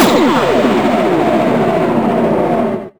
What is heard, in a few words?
weird; Best Service - XXL Production Effects